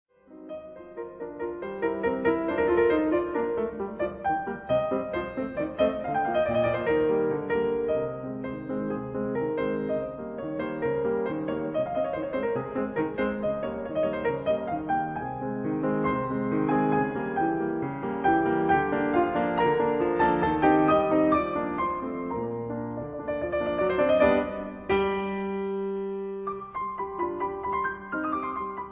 Piano Sonata in B flat major Allegro ma non troppo